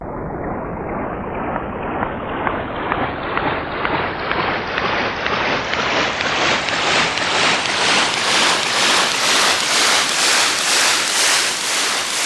sea shore; tonleiter

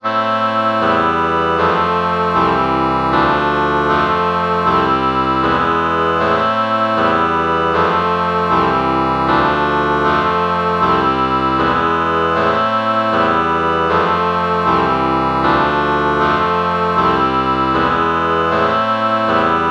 oboe oboe chord morph

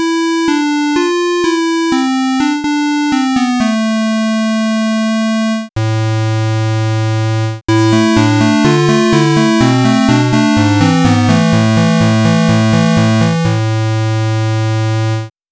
square lead

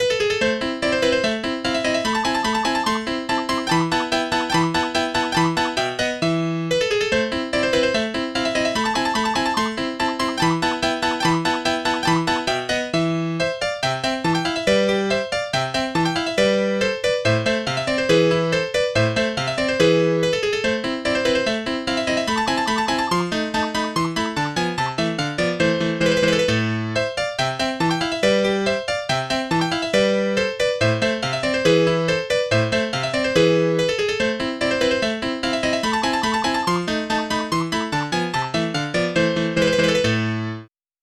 yamaha grand piano; SpectMorph Evaluation #4

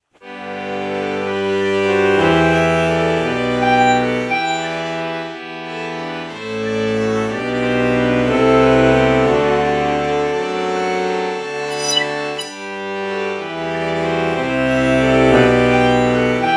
alto saxophone violin morph

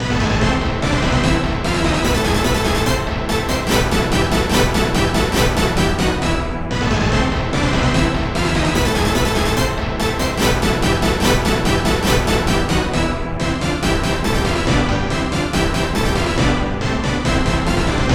orchestra hit

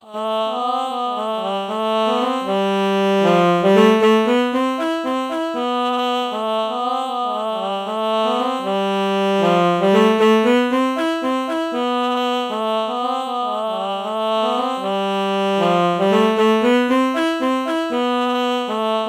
alto saxophone ah melody morph low

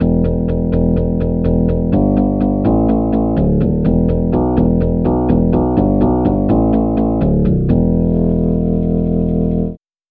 soprano sax bass

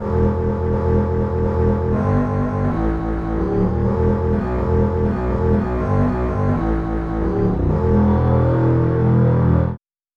tremolo bass

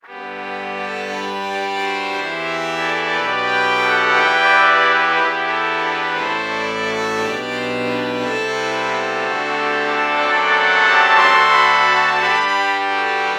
trumpet violin lpc false bach morph

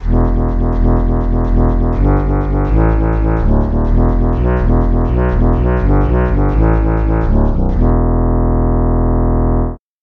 bandoneon bass

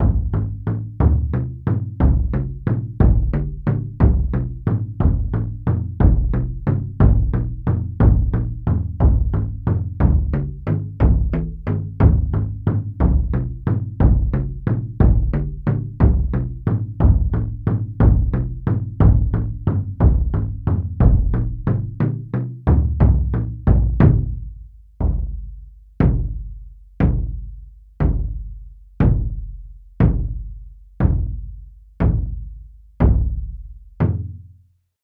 taiko drum

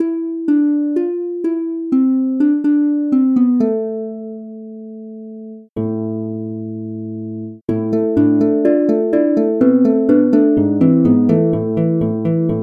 harp

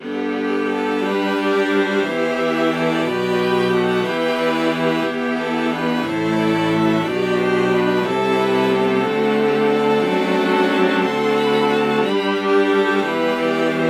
viola

